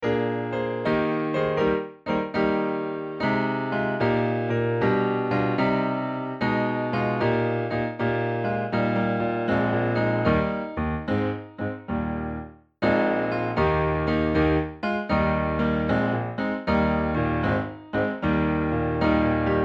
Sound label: piano, Hymns